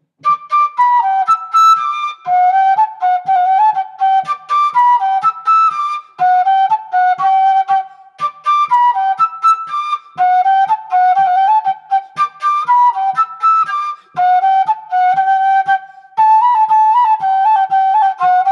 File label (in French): Rigaudon